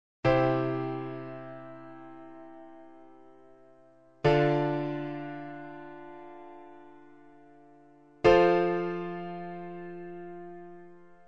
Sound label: solo chords